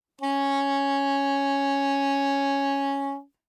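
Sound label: Soprano Saxophone vibrato ff Db4; Woodwinds; Bb Soprano Saxophone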